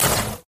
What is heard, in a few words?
skill bullet; zenmaster